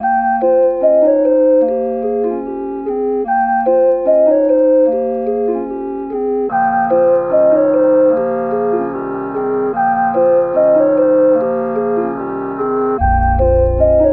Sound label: melodic loop circus 148 Fmin